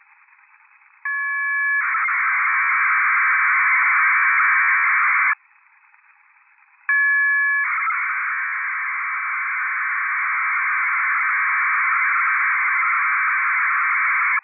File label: MIL-STD 188-110B FSK 1200Bd, WAVEFORM (BURST)